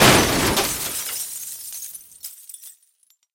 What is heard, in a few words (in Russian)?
protyazhnij zvon razbitogo stekla